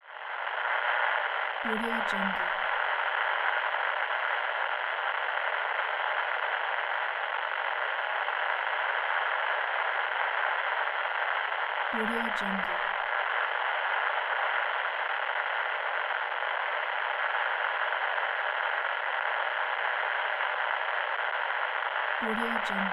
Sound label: radio noise